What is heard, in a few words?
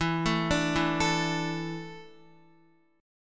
E 7th Suspended 4th, Guitar; E7sus4; arpeggiated